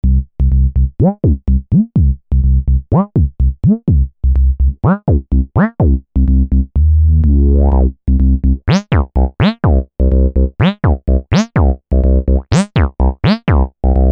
Acid Filter + Filter Distortion

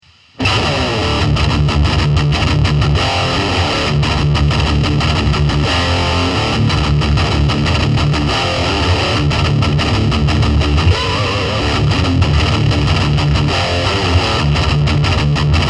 Red Channel High Gain Tone 1

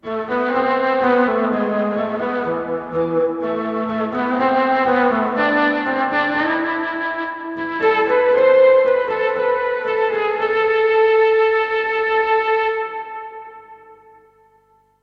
Flute

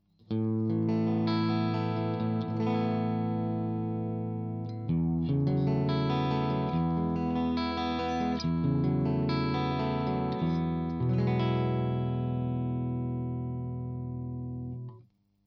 clean Position 3 KSM313 back

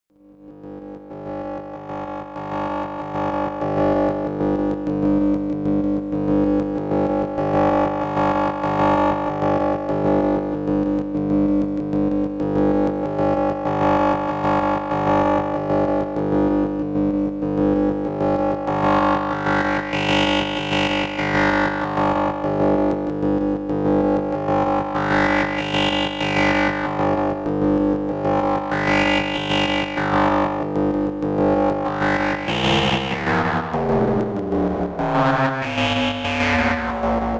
VC Panning

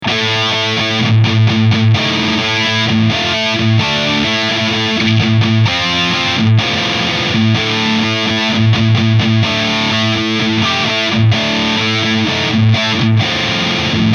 Peavey Invective MH Full Gain Riffsound

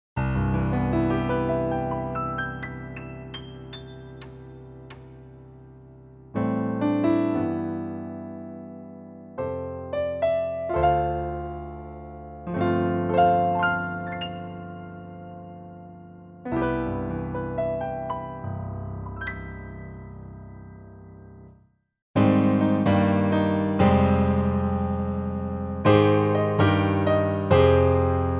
ES100 Concert Grand

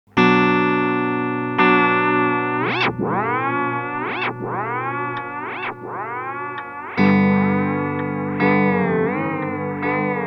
EHX Pico Rerun manual glitches

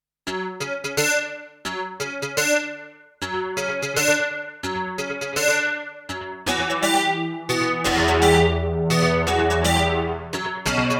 Strymon Time Line Bucketbrigade Digi Synth

